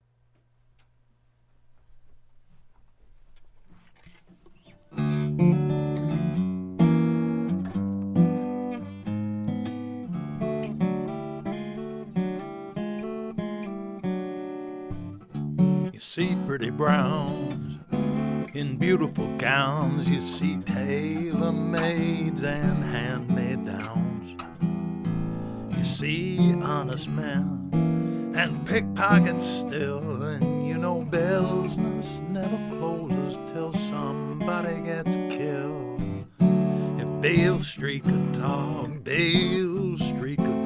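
PM1000 unbalanced output, Yamaha PM1000 modified EQ, unbalanced output. This was recorded with two Neumann KM84 mics; one at 12th fret; one 3″ from lower bout and a Neumann U87 on vocal.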